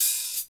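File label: hi hat; Best Service Gigapack 2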